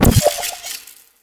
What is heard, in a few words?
portal gun fire red; weapons